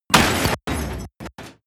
drop metal crush

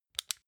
flashlight off fast

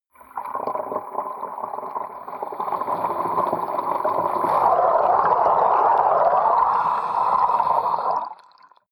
flowing submerged small

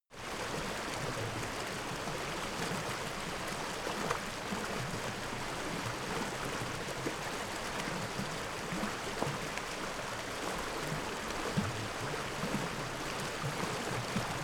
stream bubble dense

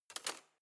knife wood drawer handle slide

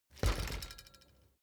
bicycle street bump front long

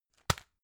tissue package drop hard

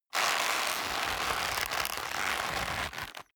leather creak hard

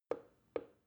plastic button start click soft slow

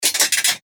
alien

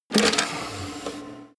cd tray open

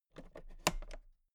toolbox latch close soft

